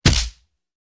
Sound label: hit; Little Big Runners